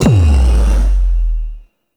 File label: Backstab Cast Start; Warframe; PaxDuviricus